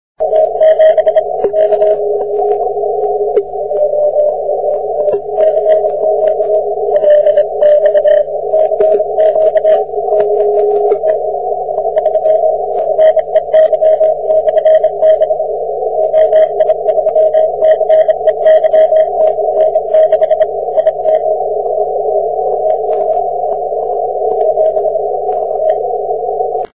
30m

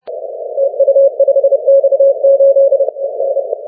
30m cw

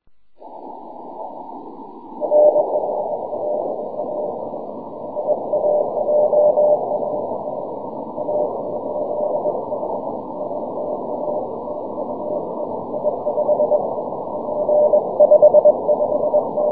6m cw

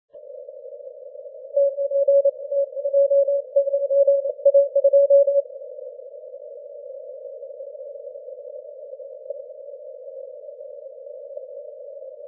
15m cw

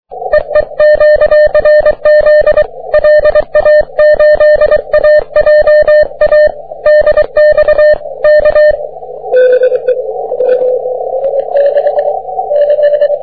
30m cw

